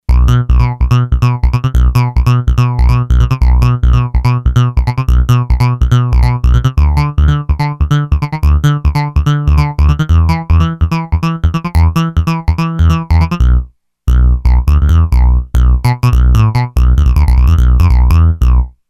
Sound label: 303 SAW BASS, Roland SC-880; Factory Patches